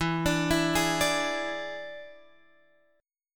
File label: E 7th E down Guitar Open G